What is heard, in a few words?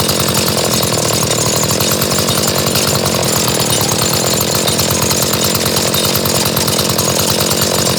Saw Loop Medium, Ghoul Sawman Attacks; Warframe; Grineer Ghouls